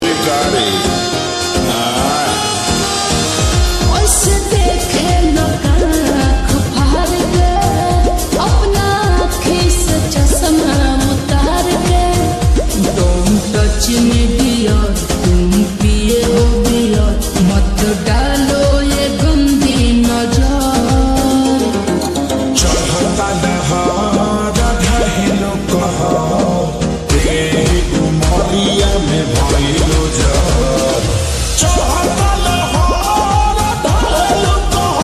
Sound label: slowed reverb lofi